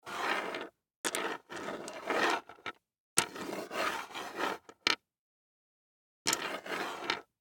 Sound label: DICE on Hard Wood, Slide, Standard, Three Dice; Samples; ArticulatedSounds; DICE Sfx Party (Extended)